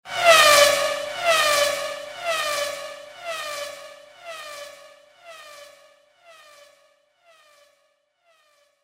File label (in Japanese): ディレイ